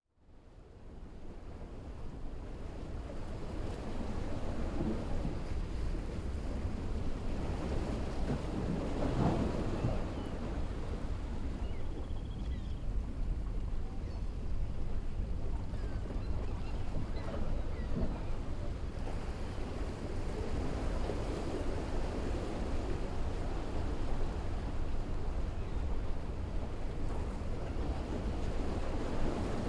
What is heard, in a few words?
Jetty Waves